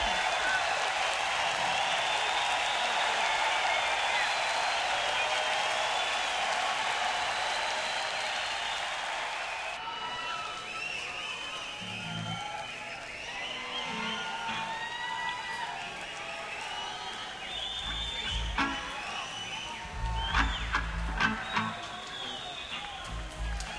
Encore Break; nak

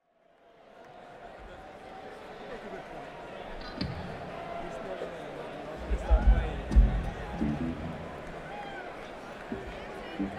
Tuning; schoeps CMC64v

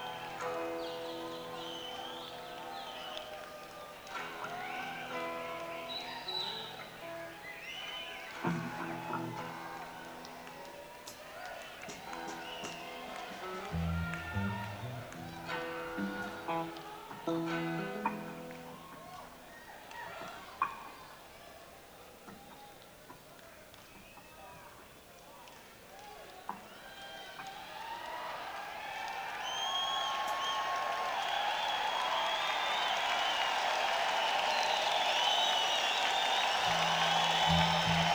Encore Break; schoeps cmc4 mk41

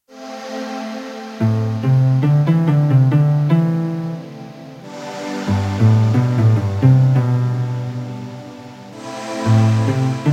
Sensitive, Kawai K1 preset

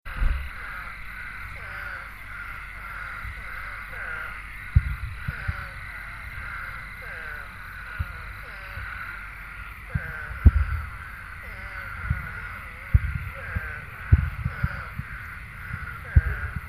Frogs dist Firewks

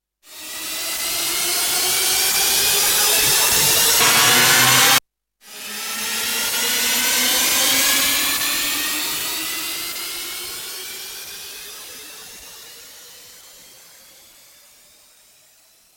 warp drive; Kawai K1; Bank 2 card A

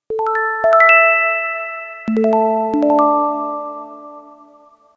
Sparklette; Kawai K1

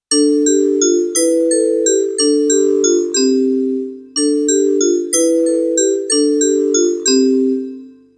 Brite Vybe No Drone Version, Kawai K1; Bank 2 card A

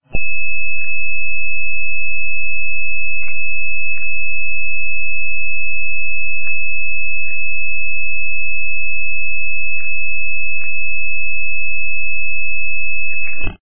A Lot Of Noise

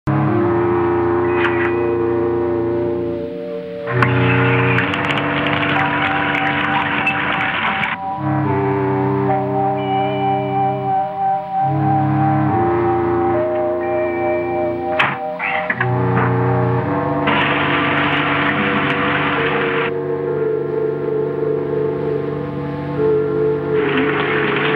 Changing Tv, Channel Surfing

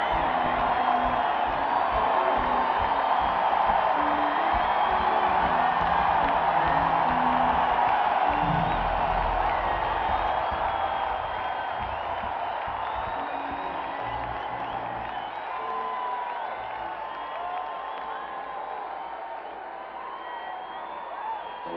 Crowd

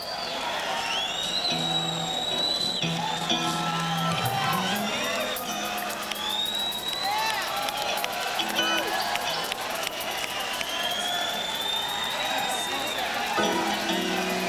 Crowd Tuning; senn421

